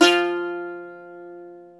SAZ F4, Plucks; Club-50 - Foundations Roland; Pluck Duo 3

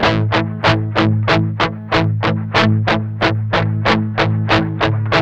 MED ROCK 8TH A#2, Guitars; Spectrasonics; Hans Zimmer Guitars VOL-1; 102-MED ROCK

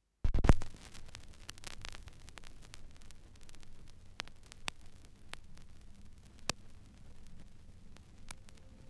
Turntablism; Sonokinetic - The Roots Drumloops

